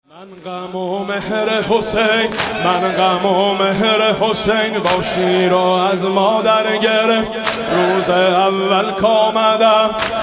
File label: maddahi